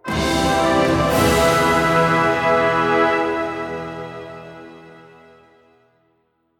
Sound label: stinger loot box; Heroes of the Storm